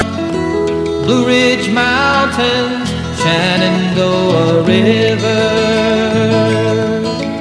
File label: country